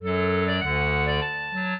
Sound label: clarinet; Mozart